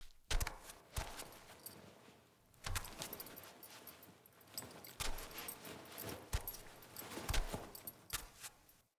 Wake Up Enter Hut Foley

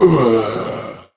Death; Warframe; Tau; Dax; Ashigaru

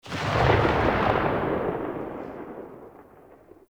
grenade launcher tail; Weapons; Scaldra

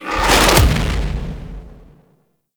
Dragon Pelt Flap Activate

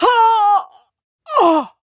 Death; Warframe; Corpus Lich; Persona B

